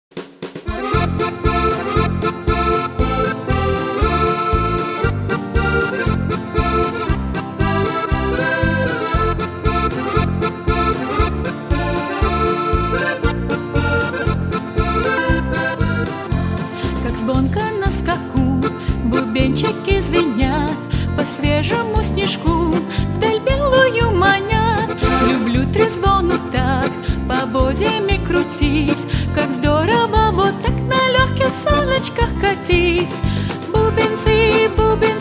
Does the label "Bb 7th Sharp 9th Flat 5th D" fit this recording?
No